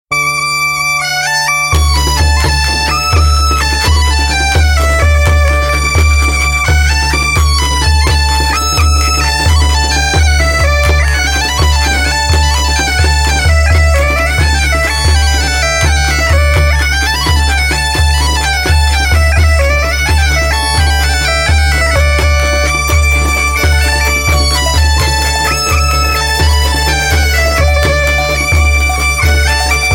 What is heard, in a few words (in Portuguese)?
Alto Minho